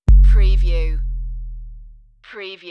Bass Dive

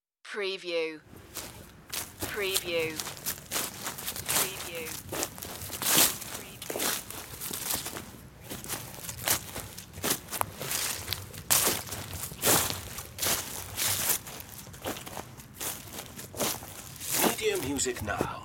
Leaf Walk

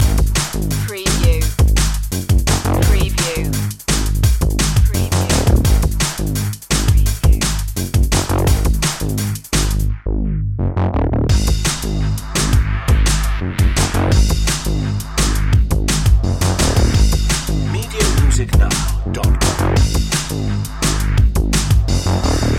lost in space loop

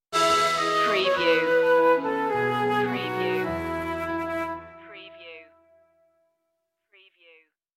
orchestral stinger; joy to the world; media music now

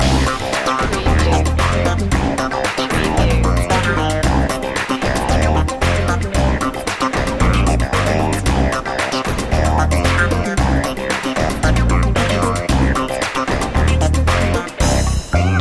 rhytmic soul loop

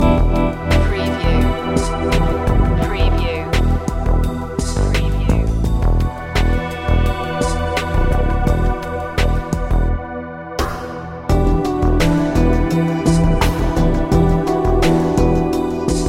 sensual and enigmatic loop

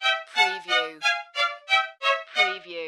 Music Strings Descend

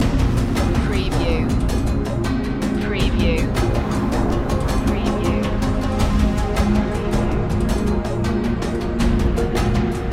miracle voices loop

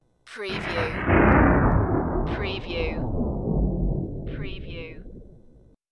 Thunder

